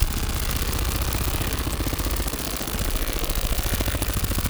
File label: Flying Loop; Mars Sortie